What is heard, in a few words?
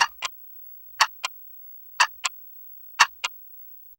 clock ticking; timer